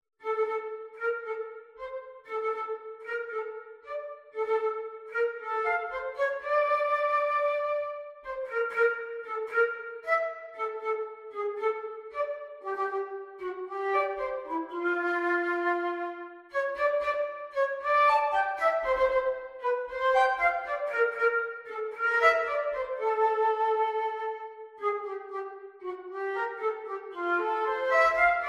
Tango, Flute

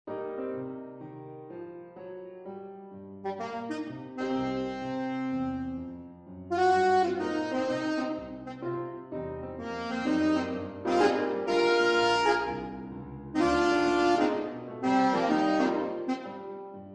Blues

